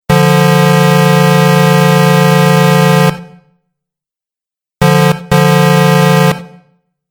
Truck Horn Two Sounds; Truck Sound Effects

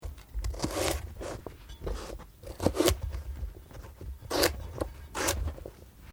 Carrot slice fine; Food preparation; Restaurants

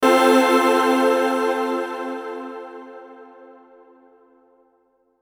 Logo Warm Musical Chord; Sonic Logo; Blastwave

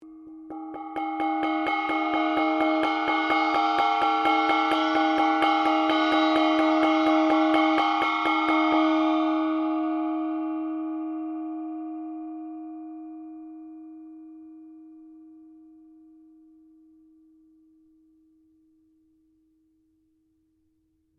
GONG CHINA SMALL CRESCENDO, Chinese gongs; Cymbals; Musical

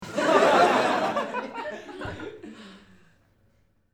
Crowd-Small Group Laugh; Small Crowd , Mixed Group Of Men And Women Laugh; Laughter; Voice

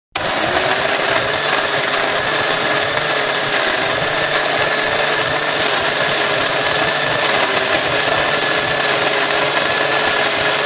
Coffee Grinder; Kitchen (Sound Effects:Household); Manhattan Production Music

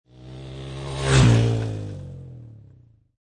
Motorcycle Dirt Bike Drive By Left To Right; Motorcycle Sound Effects; Motorcycle motor. Motorbike type offroad Dirt Bike

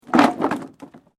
WOOD PLANK MOVEMENT

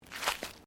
FOOTSTEPS THONGS (FLIPFLOPS) GRASS LEAVES STEP; Footstep Foley; Human

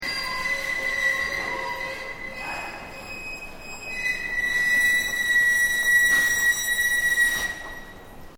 Door Roller Closing Screech, Industrial doors; Miscellaneous Door Sound Effects

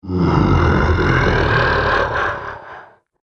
Mighty Dragon, monster sound effect; fantasy sound effect; Andrew Fleming

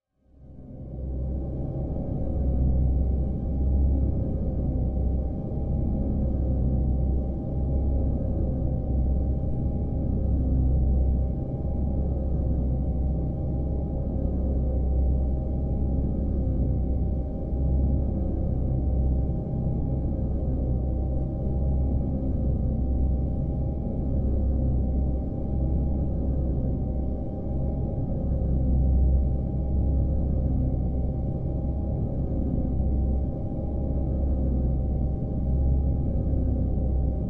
Horror Drone Dark Dissonant Warble; Scary Tones; Blastwave

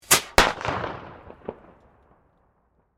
Fire, Firework, Bottle, Rocket; Backgrounds; Fireworks

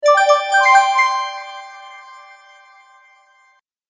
News Message, Cartoon; Cartoon Sound Design Sound Effects

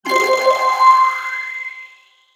Sweeper Mallet Delay Flange; Production Element Imaging Element Accent Transition; Mallet Sound Effects

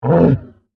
Monster Grunt, Horror, Monster, Grunt, Creature; Monsters

